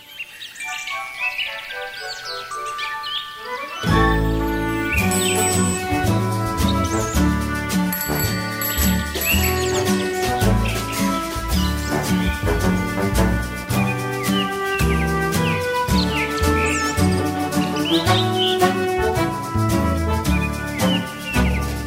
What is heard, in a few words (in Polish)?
wersja instrumentalna